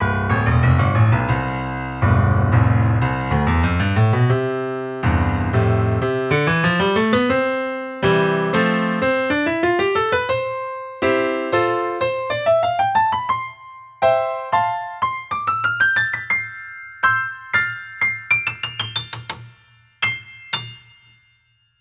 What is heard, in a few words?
Lauf EZKeys Grand